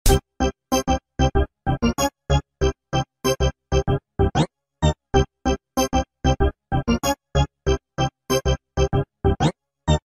funky

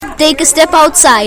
haha

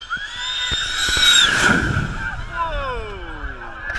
jet

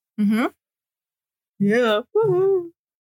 laugh

